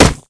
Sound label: sandbag, weapons; firearms